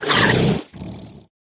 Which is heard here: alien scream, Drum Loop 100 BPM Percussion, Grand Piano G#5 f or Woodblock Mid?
alien scream